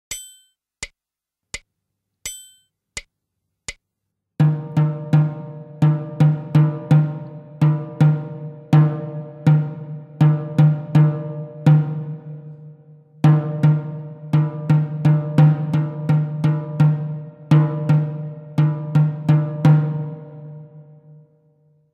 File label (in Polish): rytmiczne